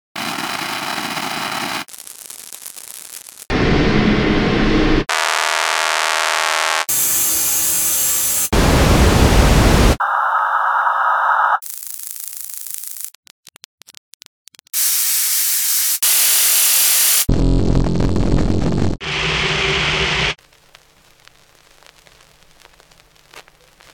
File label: Noisemaster; Muestra Samples